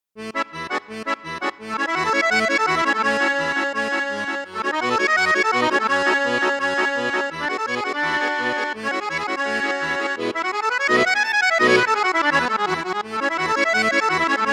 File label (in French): registre basson flute juste flute haute, paolo soprani accordeon